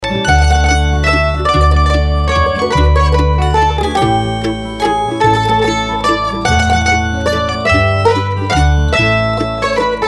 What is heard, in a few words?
Waltz Mazurka; Rita Crokers